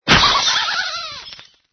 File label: black pumpking explodes; Angry Birds Seasons